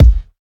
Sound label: perfect kick one shot a sharp key, Royality free steel kick drum sample tuned to the A# note; kick drum samples